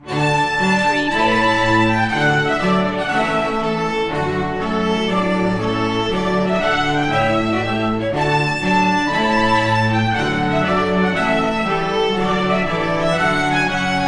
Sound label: trumpet tune; purcell